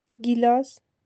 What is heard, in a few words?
glass